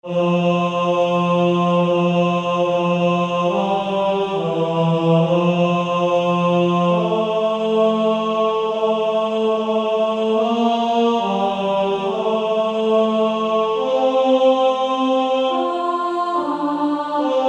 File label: Male Choir; Beautiful Savior